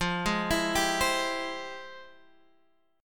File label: F Minor Major 9th, F; FmM9 arpeggiated; x,x,x,10,8,11,9,9